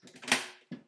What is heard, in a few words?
toolbox; Paradise